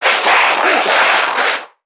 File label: Creatures Vocalisations Infected; Five Nights At Akers; soma; npc construct